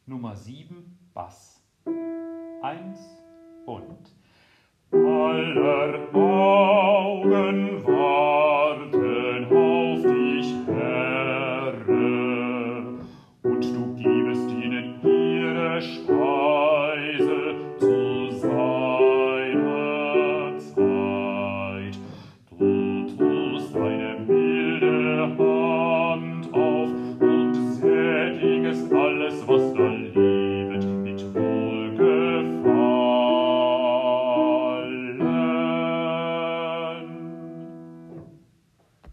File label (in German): Bass; Dioezesan Caecilien Verband; DCV Chorfahrt; Übaufnahmen